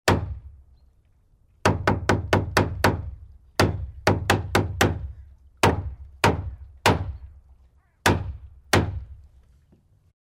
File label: hammering